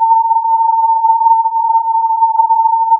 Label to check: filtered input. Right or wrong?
Right